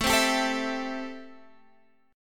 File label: A-Minor 7th A Guitar Standard; Am7; x,12,10,12,10,12; strummed; capo=2